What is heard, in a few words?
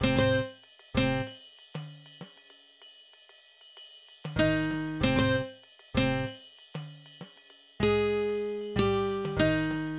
Acoustic Guitar; Guitars